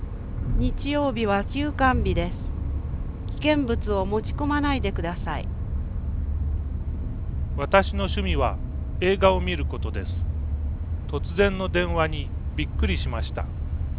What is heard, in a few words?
car